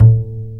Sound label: UPRIT HM A2, WOOD BASS